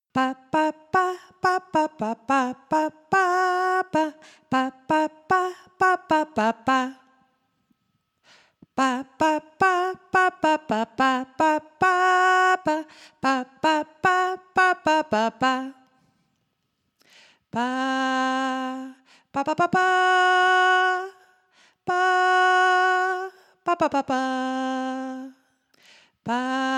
Locrio Son

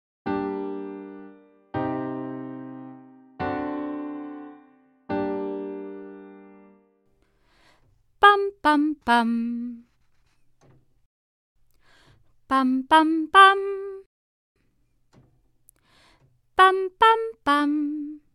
PATRONES MODO MENOR SILABA NEUTRA TONICA SUBDOMINANTE Y DOMINANTE

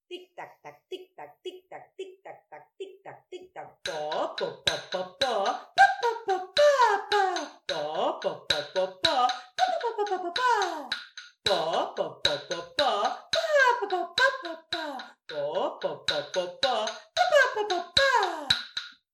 Los relojes musicales

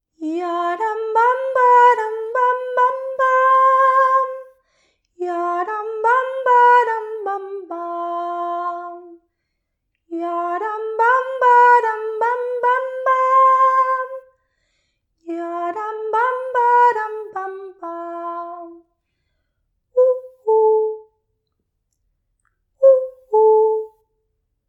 Los sonidos del bosque

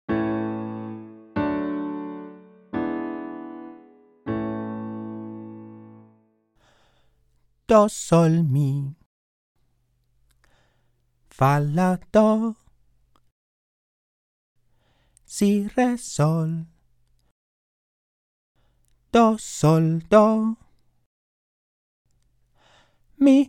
PATRONES MODO MAYOR SILABA TONAL TONICA SUBDOMINANTE Y DOMINANTE

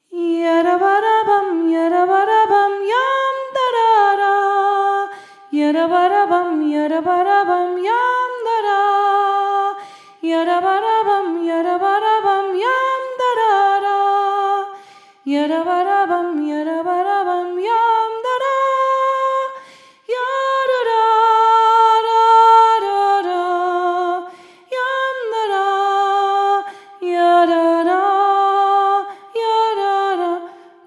Jota Mallorquina

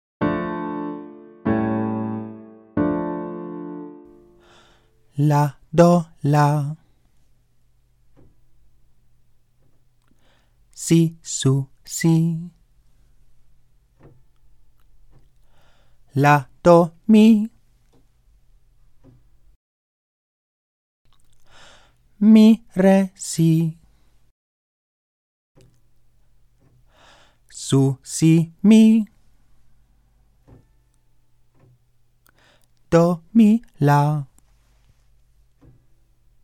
MODO MENOR TONICA Y DOMINANTE SILABAS TONALES

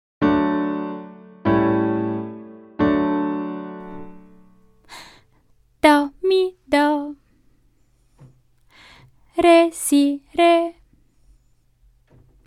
PATRONES MODO MAYOR CON SILABAS TONALES TONICA Y DOMINANTE

